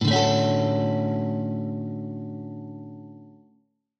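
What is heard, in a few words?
Dmaj7, Guitar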